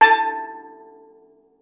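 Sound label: STEEL DRUM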